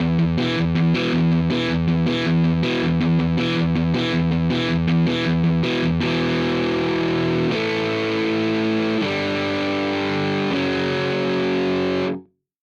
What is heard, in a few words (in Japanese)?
ギター音源; StudioOne